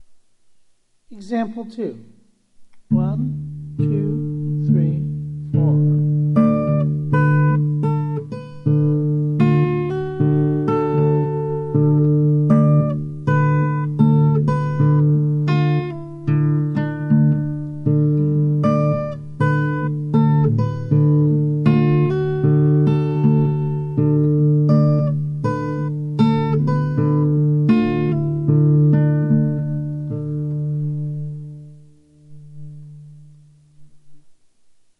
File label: drop D